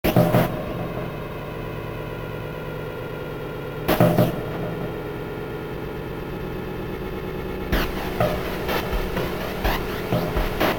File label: Introspective Noise